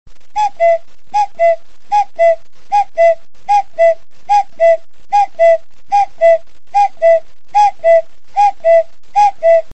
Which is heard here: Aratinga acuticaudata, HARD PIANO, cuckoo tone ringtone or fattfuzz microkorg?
cuckoo tone ringtone